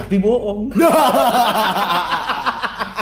hahaha

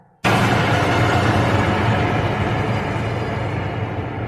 scary violins

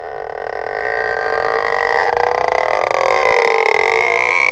long rubber stretch; disney

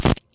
bone cracking

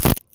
bone cracking

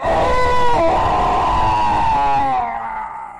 scout death scream but it s super strange

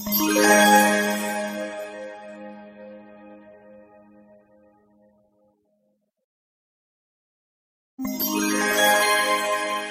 magic reveal

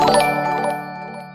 positive win game sound

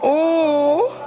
ooouuu

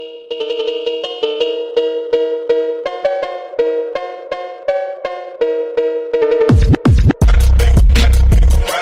crazy dance; doubledutch

